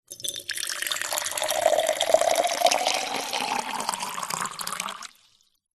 drink water pour from kettle into cafetiere french press; food